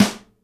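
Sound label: snare nylon damp, Live Snares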